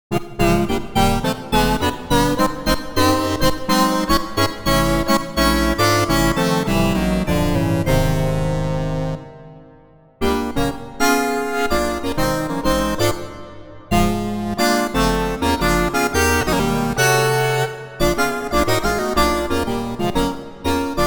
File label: FM Horn; ewI Voicing